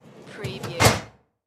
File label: File Cabinet Close